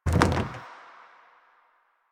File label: Pylon Plastic Roll; Warframe; Nineteen Ninety Nine